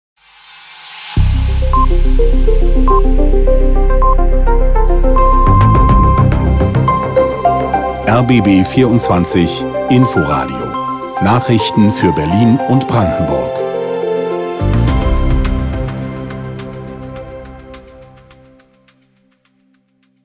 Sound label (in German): Grun Nachrichten Kurz